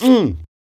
Beatbox; neuro; Sinistarr

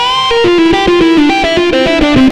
xtra lead

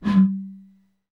PRC BOTTLE, Bottle Blws; Roland - LA Composer vol. 2

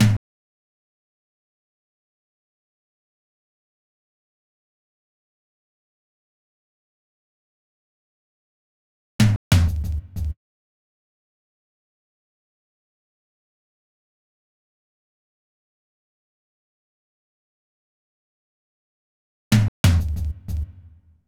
Rubber Roll, Fills; Zero-G Smoke

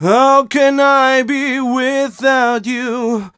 m 127 mel a, Vocals

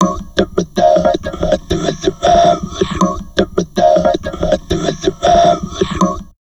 TALK, Drum Loops Crazy Processed

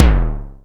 JUNO ELEC DR, Elec. Percussion; E-MU Producer Series Vol. 2; More Studio Essentials; Composer

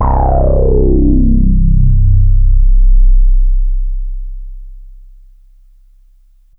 FUNKY, BASSES

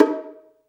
bongo; Cuba

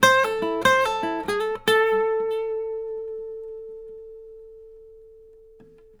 Blues Guitar Samples, licks; ILIO; Fretworks

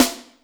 Snr Hi Class RM, Alesis D4 drum machine

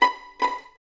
Violins B5 Pizz, String Ensembles; Miroslav Vitous; 11 Violins

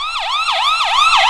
alarm; Ueberschall; Techno Trance Essentials

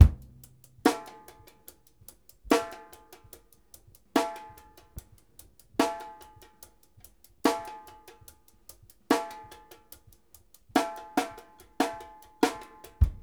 HAT + SNARE, 72; Sampleheads; New York City Drumworks VOL-1

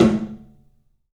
TOM, 3-D Percussives; E-MU Producer Series Vol. 5 – 3-D Audio Collection; P.A. Kit

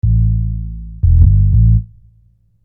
Sine Bass

